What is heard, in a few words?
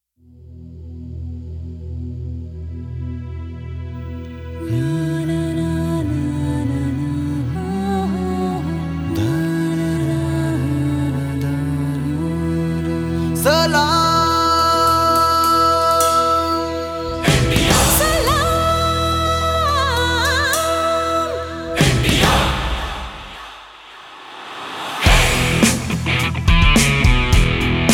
jingle; salaamindia